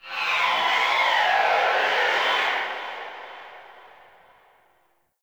Corpus Ship Energy Wind Texture Med; Textures

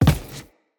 Crew Footstep Default; Railjack Crew; Steel Meridian

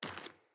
Sandals Walk Rock; Player; Footsteps

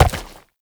Step Flesh, Movement; Uriel; Warframe

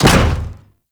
Step Default; Warframe; Corpus; Arachnoid Camper; Steps

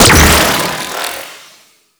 Droid Array Spin, Weapons; Warframe; Cephalon

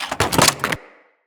Weapon Drop; Weapons; Grineer

